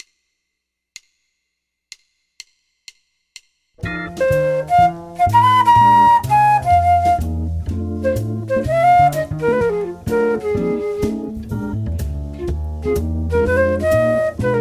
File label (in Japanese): Blues メジャーペンタとマイナーペンタ みっくす